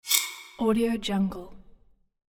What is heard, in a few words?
metal swipe